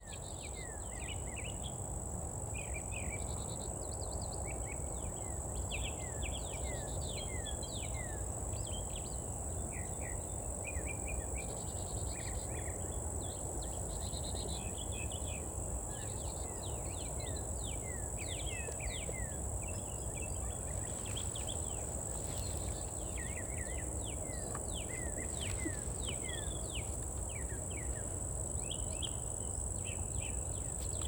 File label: brown thrasher mockingbird chickadee crickets; field recordings; scout island